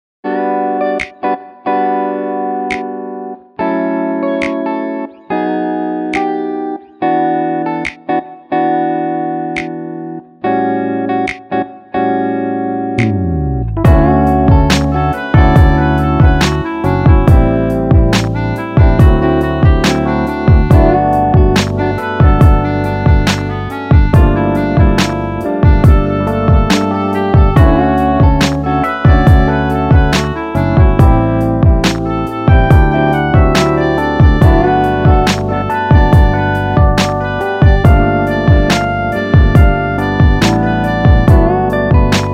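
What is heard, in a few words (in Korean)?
Piano version 멜로디MR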